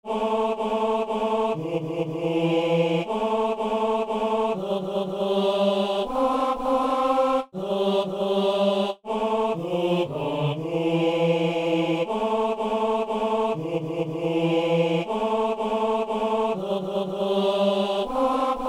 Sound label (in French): laudate dominum, basse; Saint-Denis